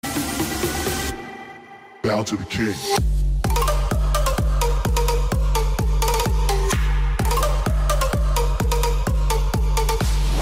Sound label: classical